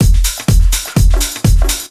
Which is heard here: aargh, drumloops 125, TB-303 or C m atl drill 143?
drumloops 125